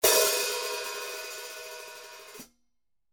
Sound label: 14in Custom Session HiHats, cymbal